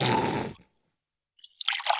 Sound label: Mouth Coffee Splash Loop 120